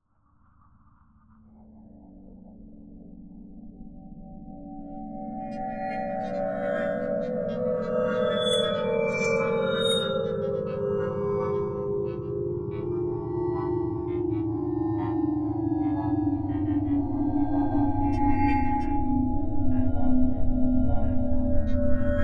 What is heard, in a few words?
Inside Track Long Swirls